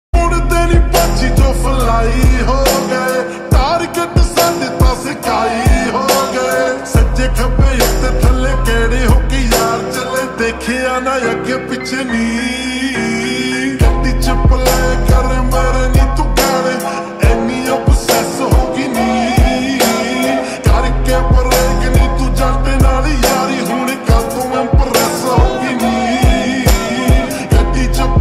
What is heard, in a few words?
slowed reverb ringtone; instagram reels bgm